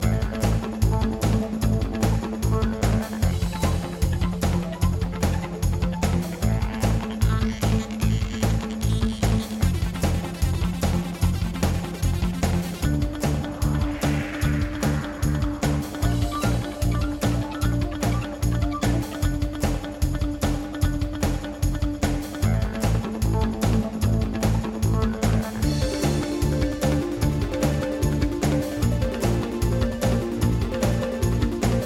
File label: Underscore